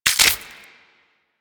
Modular Clip Small Mech, Weapons; Warframe; SU Modular Guns

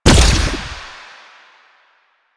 Fire Shot, Weapons; Duviri; Incarnon Adapters; Incarnon Dera Vandal

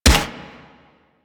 Swarm Rifle Shot; Warframe; Infested; Shock Swarm Rifle

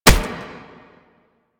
Proto Infested Brachiolyst Step D; Enemies; Steps; Warframe

